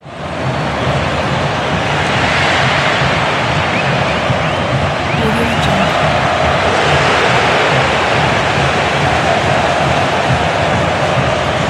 soccer cheer background sounding

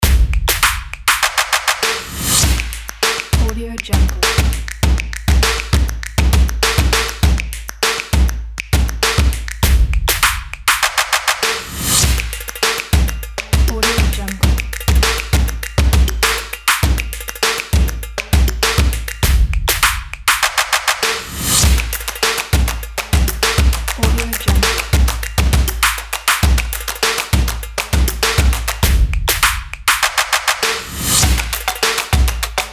urban and energy stomps claps

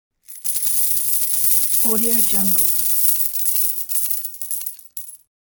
coins; supersoundfactory

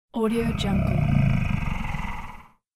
monster snore breath

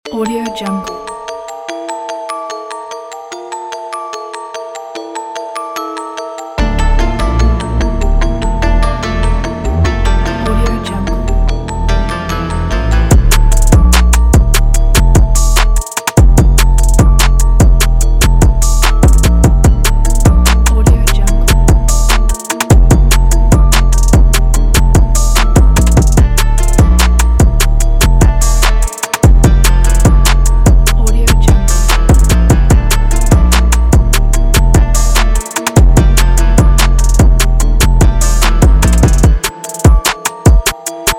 the trap beat

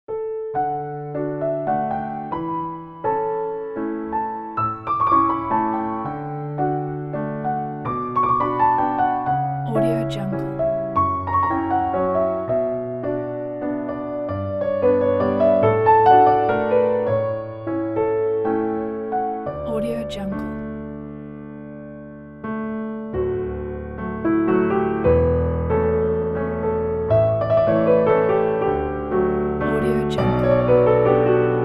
valse

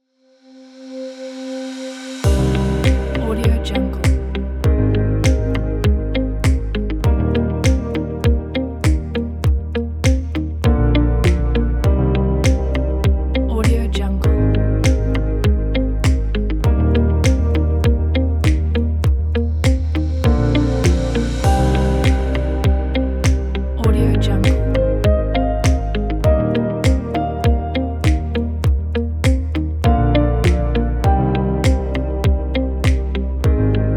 ambient background; movingnotessounds

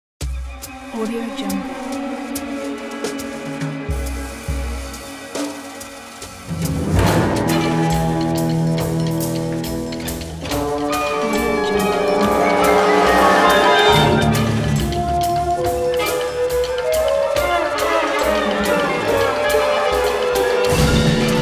cartoon spy; gballx

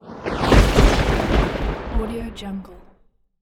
trailer thunder impact whoosh; audiochemicals

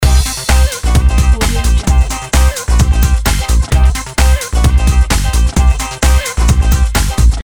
crazy discofunk loop; gamechestaudio

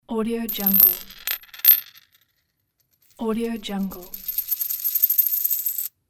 coin spinning

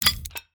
puzzle game lock medium

